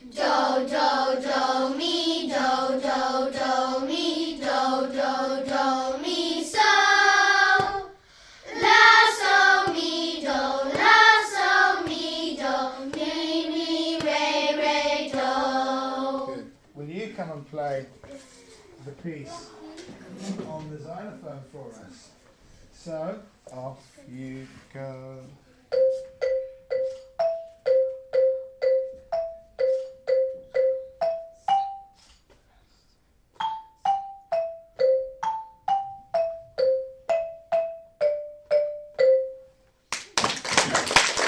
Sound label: Solfa in C xylophone; Yr5; Rocky Mountain